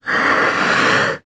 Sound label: Ocelot Hiss Medium